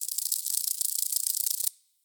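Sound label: ambience beetle; wingsofriches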